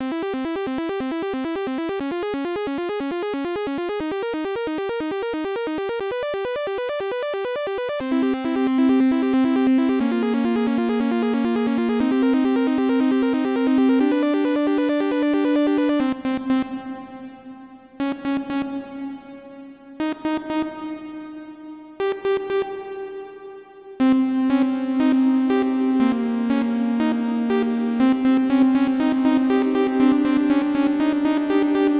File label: race loop